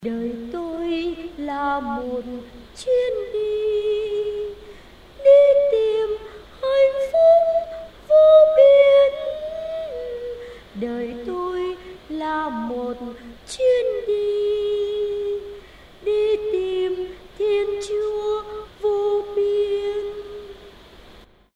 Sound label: tenor basso